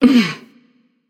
ugh passion; chaac

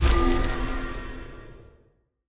deactivate; smite; tiamat

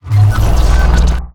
spell summon; smite; osiris

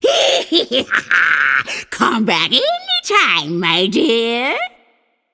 "yay"; babayaga

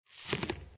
bow back; smite; medusa

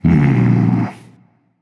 Thinking; smite; voicepack; hercules

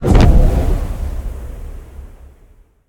Arcane Activate; smite; god merlin

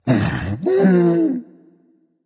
fear berserker; cuchulainn

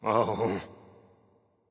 grunt ugh; bacchus

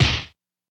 fire impact; smite; poseidon

